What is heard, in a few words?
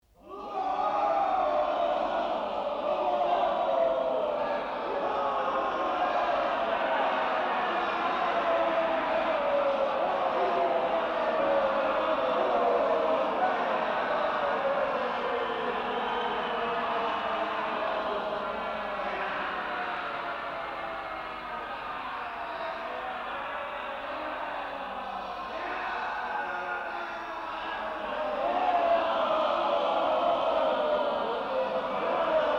japanese battle atomsphere